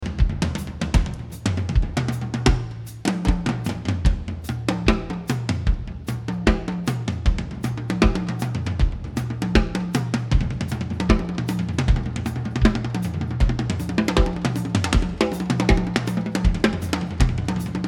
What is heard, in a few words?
Drums